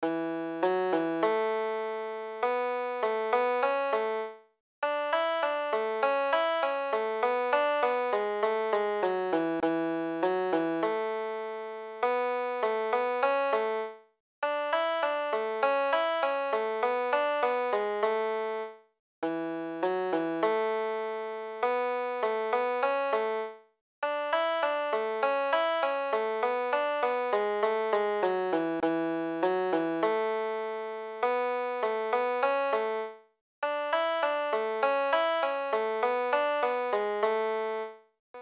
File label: Solo Banjo; Brighton